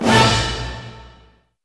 hit

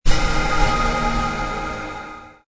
success

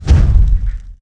fireball

